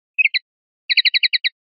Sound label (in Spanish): toques de tateo; pardillo; rep adornos